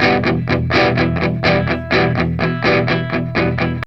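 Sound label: 125, Vintage Blues Guitar rhythm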